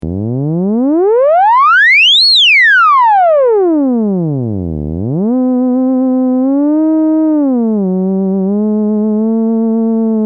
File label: theremin